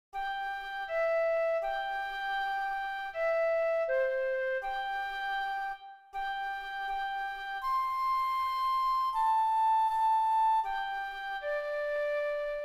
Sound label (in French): FLUTE